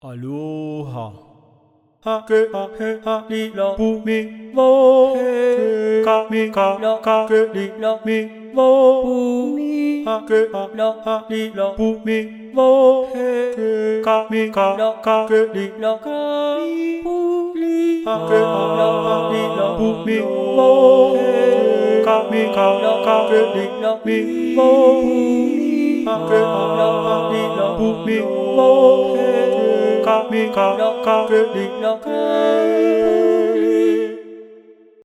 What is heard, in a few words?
tiki voices; tiki instruments; chiqui audio